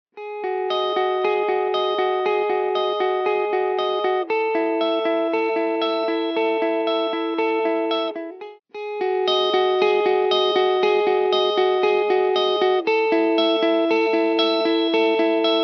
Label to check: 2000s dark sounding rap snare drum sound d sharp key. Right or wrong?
Wrong